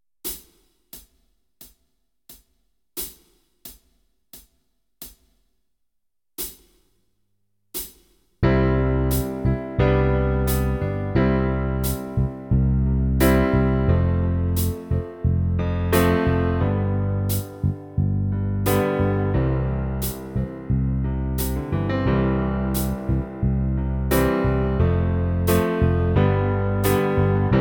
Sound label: Backing track; Kenneth Baker - The complete keyboard player